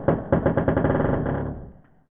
church, monastery; soundscapes

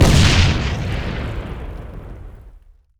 frag explode

